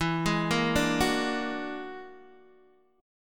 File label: E 9th Flat 5th E down Guitar Open D